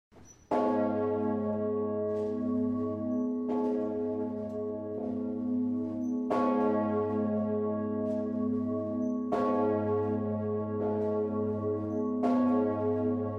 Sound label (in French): cloche des morts grand bourdon; 1427; cathédrale de Strasbourg